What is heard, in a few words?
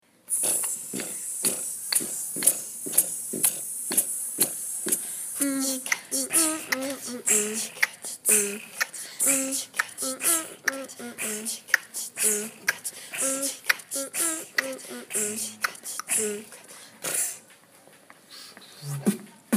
Beatbox Impro Groupe